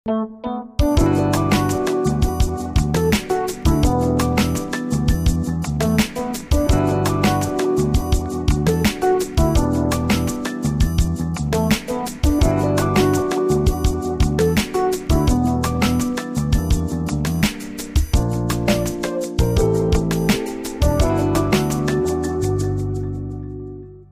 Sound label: Jingle; Elektra Bregenz